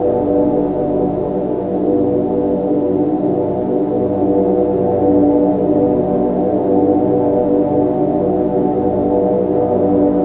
drone, ambience

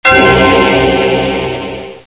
creepy bell

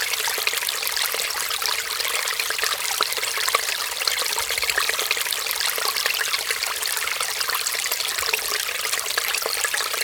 ambience water

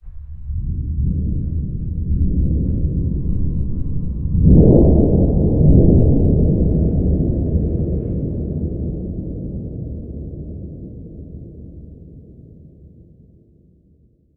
big hit far

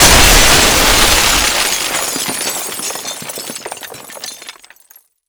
glass break

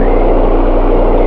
amb

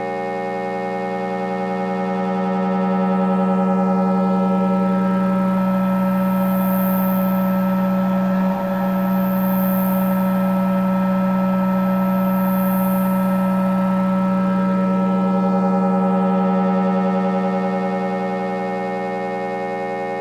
ambience

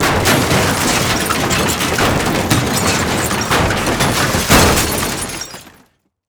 long break

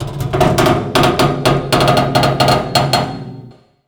metal creak

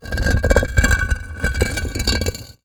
rock slide

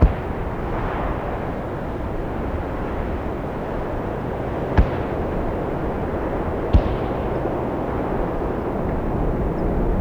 ambience outdoor battle

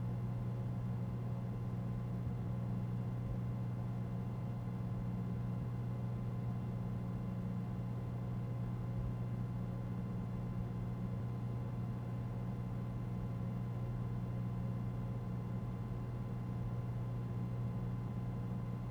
ambience small

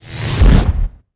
start cloak

